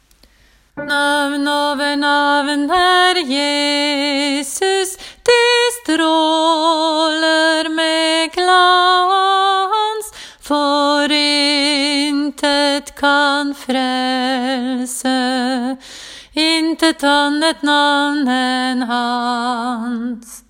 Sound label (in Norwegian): si kjenner du alt; åfjord